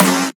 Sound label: original trap snare sound C sharp key; snare drum samples